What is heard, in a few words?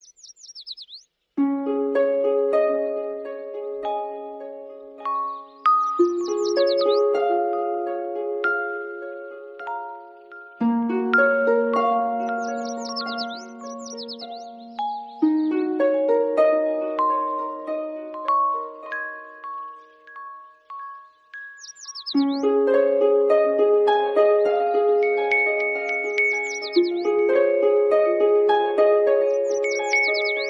flute morning instrumental